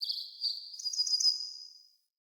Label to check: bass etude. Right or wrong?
Wrong